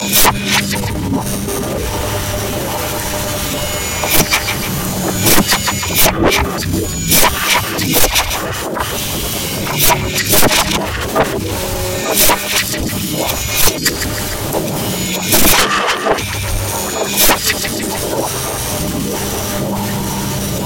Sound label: abyss glitches ambience